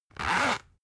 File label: zipper close